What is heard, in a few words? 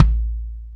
KIK, Real Kiks; Club-50 - Foundations Roland